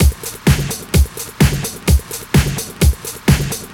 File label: House Beat, 128 BPM